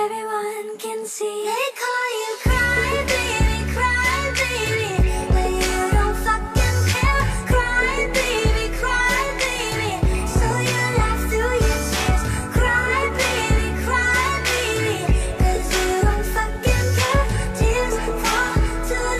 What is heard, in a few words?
cry beby